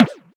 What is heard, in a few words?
Noggin, Classic SFX, cartoon; musicradar; cartoon caper